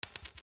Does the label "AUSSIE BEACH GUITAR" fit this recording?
No